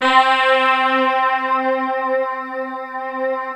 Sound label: ENSONIQ VFX MARSRISE; Rubber Chicken Software Sample Bank